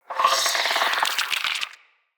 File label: Root Spider Vocal Chatter; Animals; Spiders; Vocals